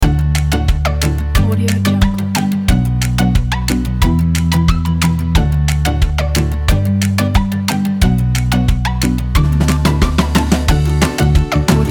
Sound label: positive and playful